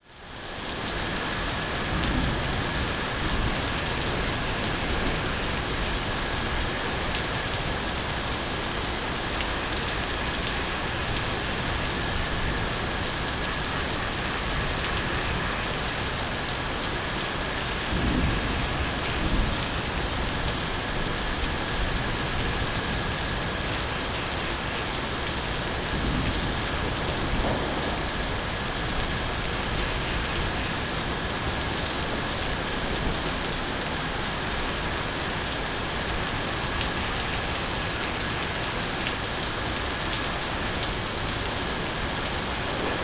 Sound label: Weather Ambience Rain Forest Jungle Insects; AdobeCC